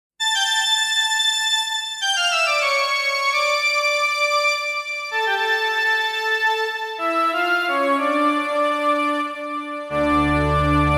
prologue ~ from 'toccata and fugue in d minor bwv 565'; j.s. bach